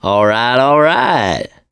positive noise, mechanic; voice

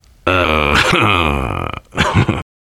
laughter, survivor voice biker

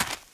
dirt, footsteps run; survivor

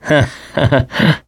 laughter, mechanic, survivor

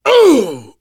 hurt major, survivor voice, biker

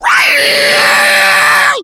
alert, become enraged; npc, infected, becomeenraged

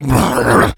shout, infected; npc, alert, becomealert

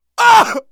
hurt critical, survivor voice; manager; Left 4 Dead 2; left4dead2 dlc3

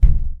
drywall impact soft; physics, plaster

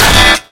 melee guitar; weapons

